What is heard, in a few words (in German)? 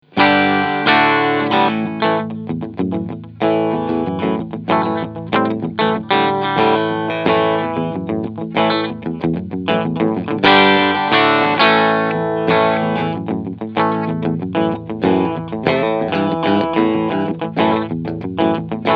Reverend Flatrock E Gitarre Steg Clean